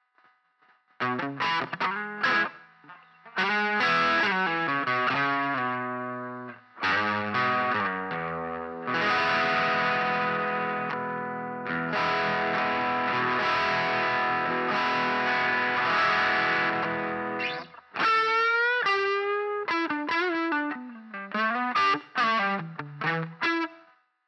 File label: crunch, guitar amp simulation